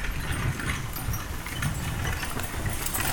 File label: Horse Drawn Wagon By; Electa Quinney